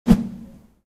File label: Transition Ui